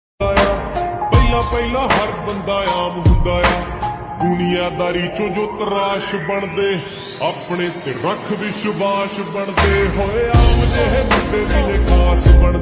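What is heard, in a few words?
cute ringtone remix slowed reverb love ringtone; cute girl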